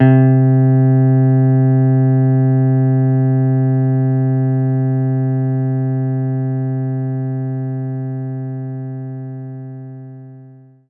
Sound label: Jazz Guitar C3; knights try